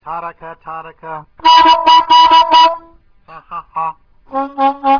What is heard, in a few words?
Articulations, diatonic